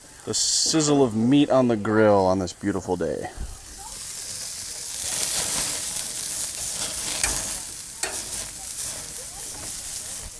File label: grillin